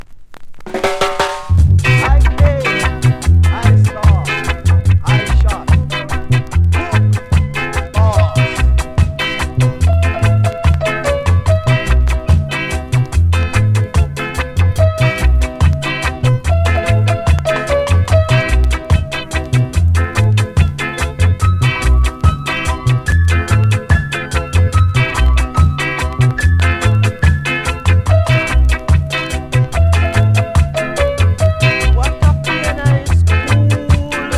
newsroom

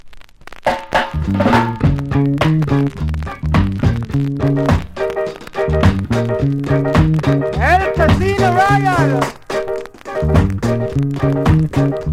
honky tonk

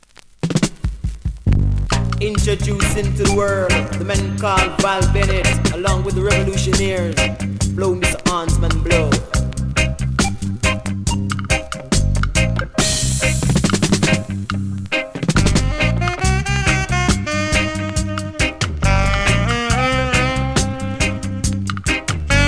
blow mr hornsman blow

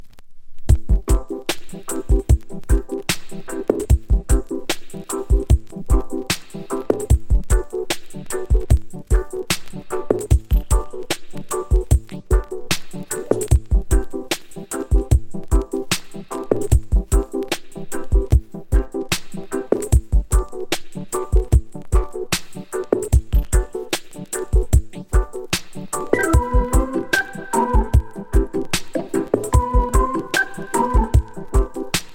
oboe

